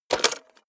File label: cassette player slide tray take out hard